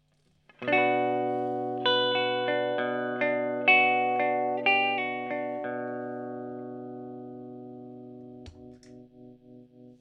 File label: tremolo amp